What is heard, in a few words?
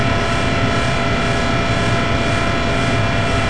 fan; cs jackpot v2